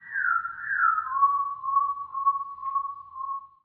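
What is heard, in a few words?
Special Photo Barcode Forest Environment Bird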